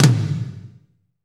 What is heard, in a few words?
TOM, Roland; Northstar - Drumscapes Roland; Kit Wet